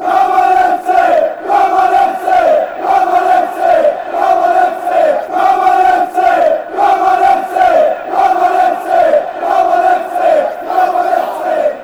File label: come on, chants